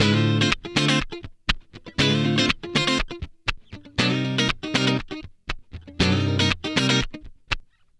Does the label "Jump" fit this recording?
No